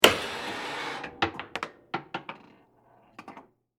Arcade Game Frog Ball, Technology, Arcade, Game, Frog, Ball, Operate; Arcades